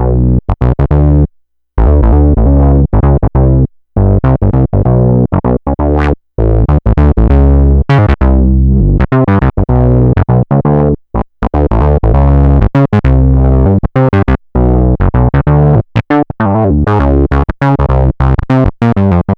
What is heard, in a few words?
Synth loop 99 Bm, Synth Bass Loops; Slate Digital BASSHOLE Sample Pack